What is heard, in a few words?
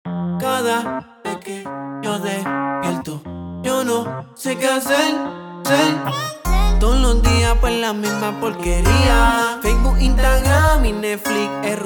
Trap